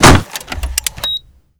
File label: bomb plant c4 breach; Call of Duty Modern Warfare